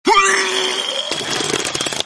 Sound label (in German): Klingelton für die Systembenachrichtigung